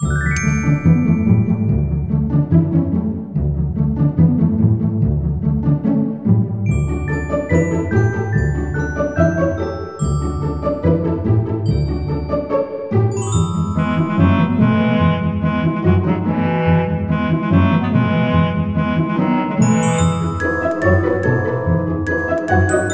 music town

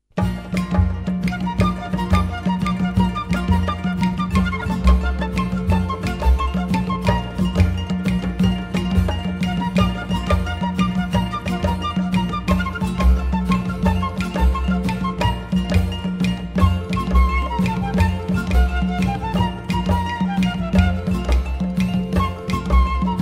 Guaracha; Where in the World Is Carmen Sandiego

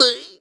vault vocal; Scrat

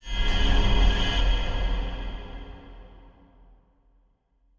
Encounter Victory; Tainted Grail

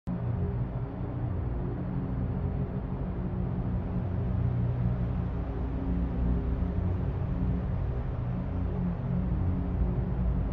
Hub Ambience; escape the backrooms

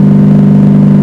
camaro offlow

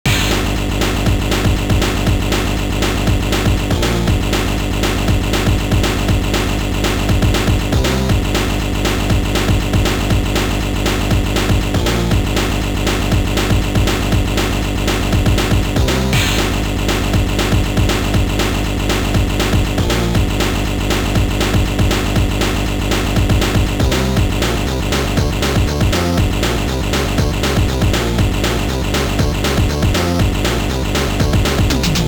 Music Storm; Danny Phantom Urban Jungle; GBA

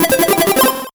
MUSIC EFFECT Solo Chip Arp Positive (stereo); gamerip; The Prometheus Secret Noohra

